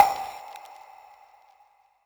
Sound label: perc; eddyflux